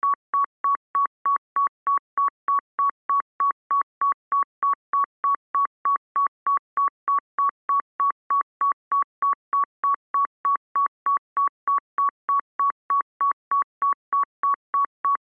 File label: Sci Fi Alarm Beeps Digital Technology Readout Loop; Control Panel